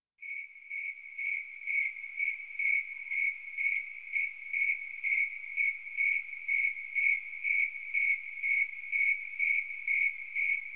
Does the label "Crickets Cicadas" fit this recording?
Yes